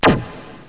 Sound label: powerup